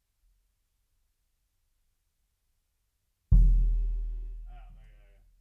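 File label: BD Out, bass drum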